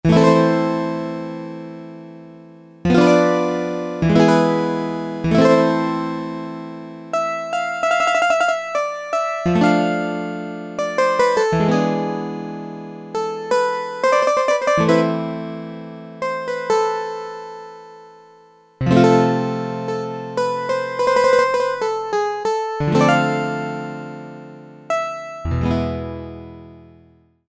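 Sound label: guitar baroque; E-mu Proteus FX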